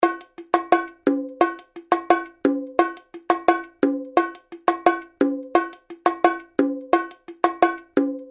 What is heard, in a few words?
bongos; lateinamerika